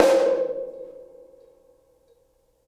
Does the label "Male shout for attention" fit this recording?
No